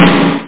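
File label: snare drum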